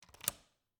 Cabin, front door, deadbolt, lock, latch, thick, close, loose, hyper; Eiravaein Works; Vaeyan IV